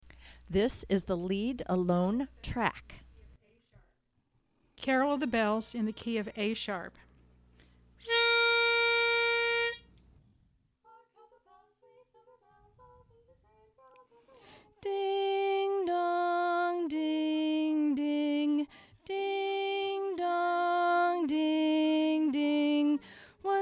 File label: Carol of the Bells Lead Alone